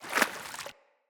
Corrupted Battle Dog Walk Water Step, Foley Footsteps; Animals